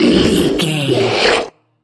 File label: troll monster death slow